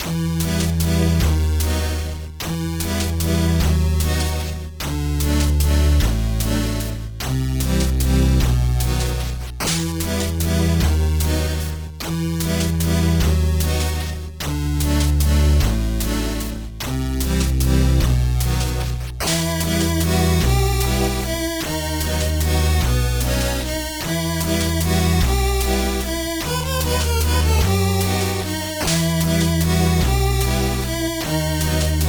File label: music windmill; Skellboy